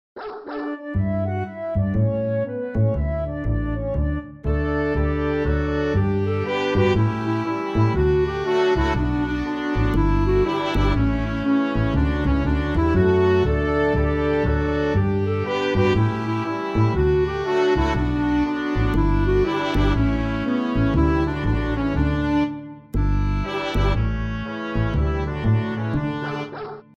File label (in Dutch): zonder zang